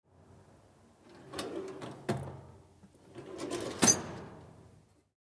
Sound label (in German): Schublade